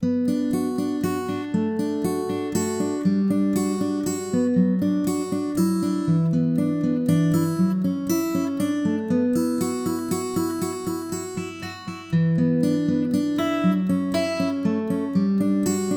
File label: guitar; suikoden